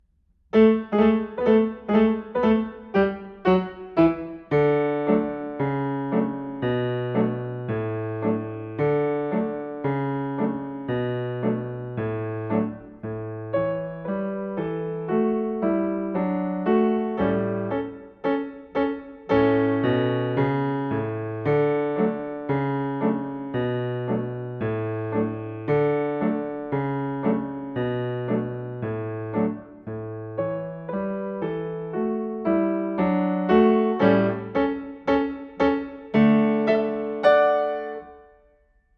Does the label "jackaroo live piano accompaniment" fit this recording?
Yes